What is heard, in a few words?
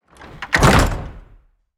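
wood double light close, door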